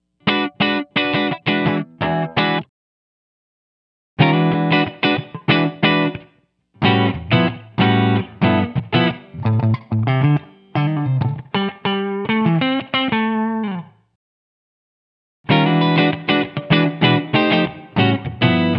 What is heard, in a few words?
room; BlueSky